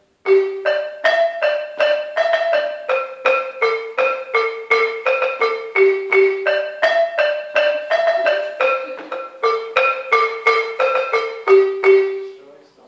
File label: Balafon